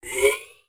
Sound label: sfx, futuristic weapons sound effects